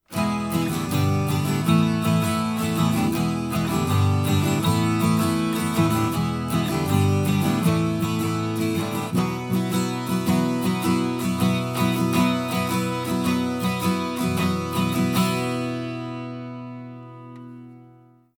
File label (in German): 12string 4 Gitarren alle anderer EQ